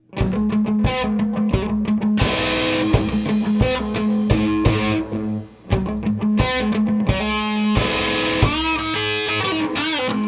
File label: Guitar with drums, Lead guitar